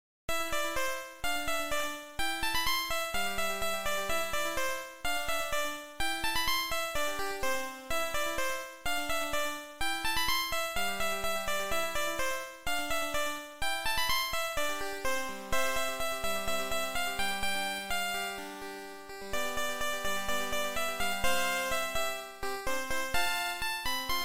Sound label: ringtone